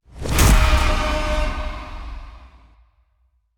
ball goal; fallguys

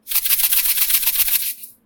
salt shaking angry; ragemap2023

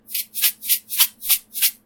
salt shaking determined; ragemap2023

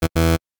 door beep; fallguys

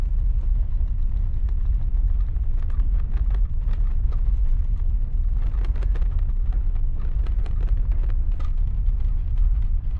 conveyor rolling; fallguys

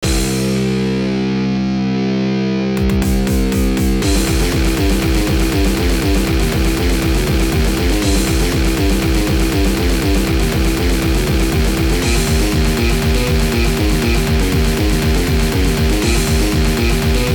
game soundtrack; ragemap2023

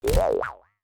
bounce; fallguys

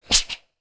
rhino attack; fallguys

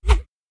fake tile; fallguys

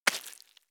footstep slippery; fallguys

